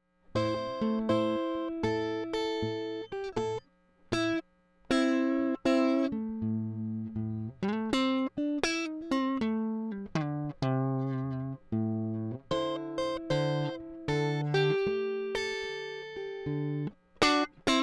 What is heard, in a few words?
strat stock delta blues